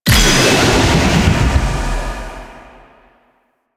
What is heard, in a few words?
Vortex Ability Cast; Warframe; Duviri; Duviri Dax; Abilities; Ashigaru